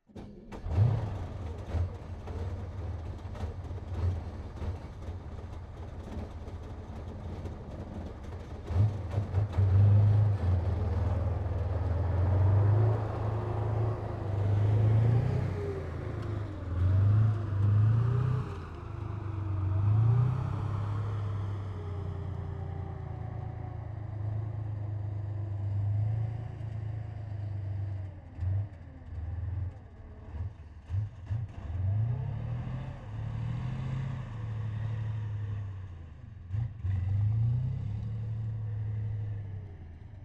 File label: TANK T-44 SPOT A LR Start Medium Drive and Approach Stop; FLYSOUND